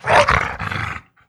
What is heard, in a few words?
zombie dog hurt